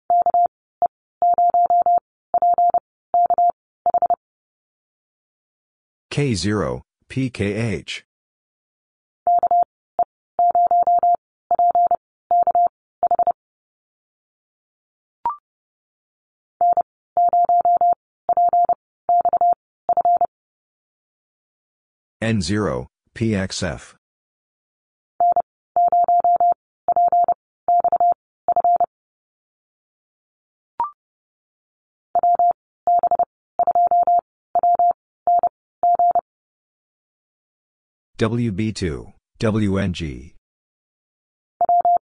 Letter x - Call Signs 30wpm, morsecode

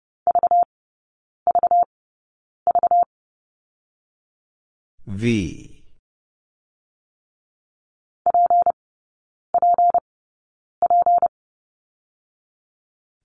BT Single Character Repeated 3x 30wpm; Lesson 36b

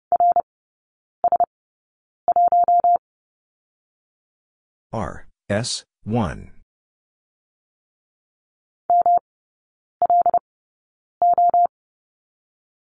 Letter m - Three Characters 30wpm, morsecode

